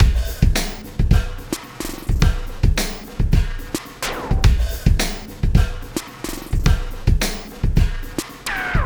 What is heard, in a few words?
Sky Lab Beat, Processed Drumkits